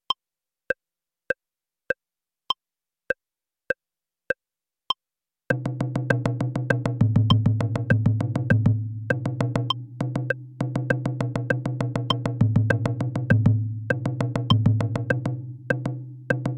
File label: hocket 100bpm, taiko